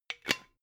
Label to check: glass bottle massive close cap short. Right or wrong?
Right